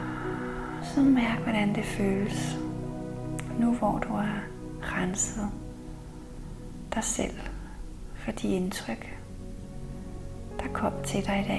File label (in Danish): musik; Sliparbejdsdagen